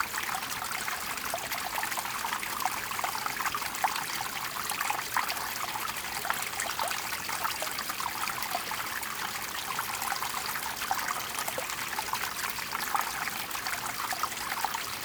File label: water stream small; best service Blue Box